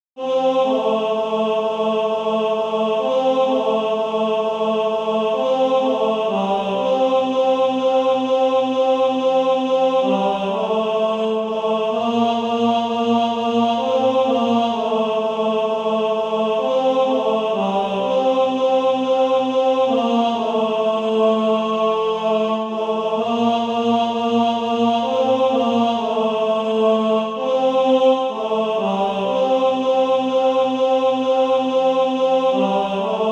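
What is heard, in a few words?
tenor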